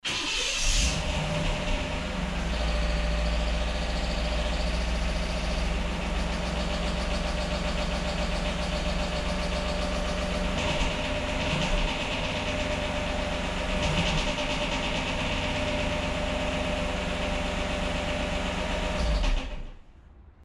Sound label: my eng blown